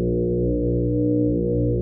plane water

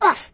Ooff, voices, Pirate; hedgewars

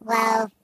Jump, Robot voices; hedgewars

